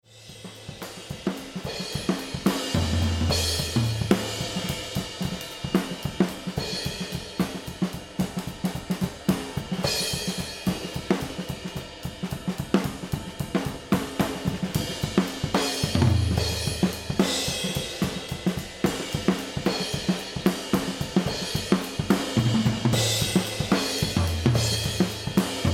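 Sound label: Mix Drums overhead Comp On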